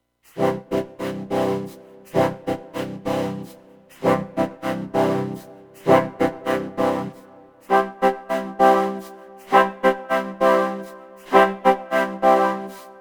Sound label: trumpet and bones white noise, vocoder; analog synth